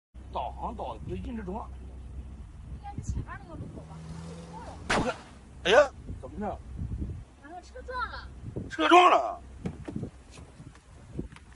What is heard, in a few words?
laugh; breadradio